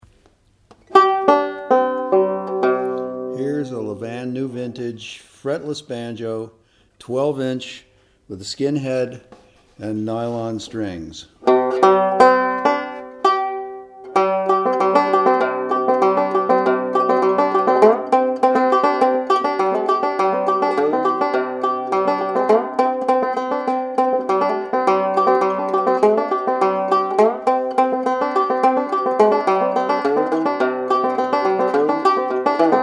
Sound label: fretless, banjo